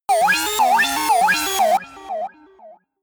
shot futuristic wobble ringing; digital; soundpool

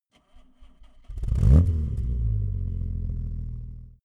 car jaguar e-type startup exhaust

